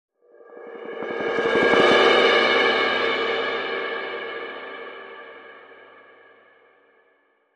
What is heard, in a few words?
Faint Cymbal Roll